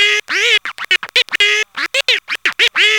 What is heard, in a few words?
SCRATCH; E-MU Formula 4000 Series Vol. 1; Hip Hop Nation